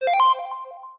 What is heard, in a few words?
Sfx Basketball